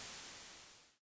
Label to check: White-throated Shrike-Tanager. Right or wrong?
Wrong